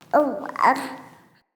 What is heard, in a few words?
Baby; Cinematics; Warsongs; Warsong Prologue